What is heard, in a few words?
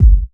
natural bass drum sample f sharp key; kick drum